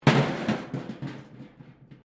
metal rubbish bin smash concrete

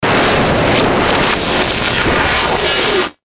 Stinger Multi Whoosh; Production Element Imaging Element Accent Transition

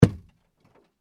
impact snowball car window; Impact - Snowball Hits Car Window; Drops

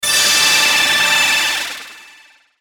static bright; Static Bright Swell Fuzz; Production Element Imaging Element Accent Transition; Blastwave

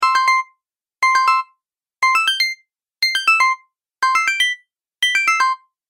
Game Up Down Chimes; video game

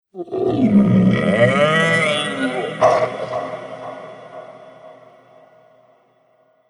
Shape Shifting Monster Pain; Monster Sound Effect

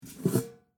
Spittoon Pick Up, Metal; Foley; Pick Ups (Sound Effects:Objects); BlastWave

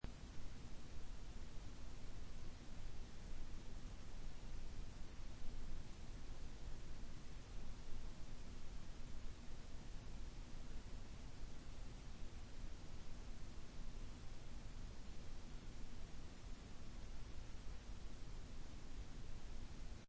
Room tone in bedroom.; Backgrounds

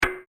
Single Click; Andrew Fleming

